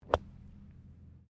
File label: Golf swing wedge bunker; Sports; Various Golf sounds